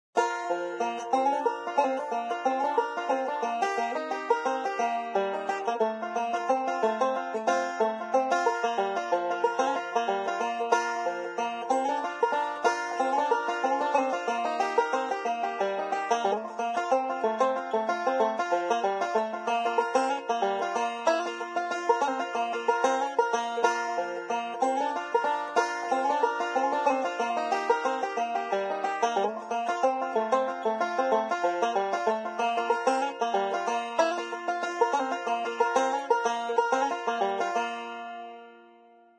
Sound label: Banjo; Zomboid; Lifestyle